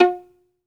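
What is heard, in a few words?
solo violin, Synclavier Strings; ILIO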